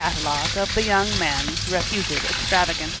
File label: noisy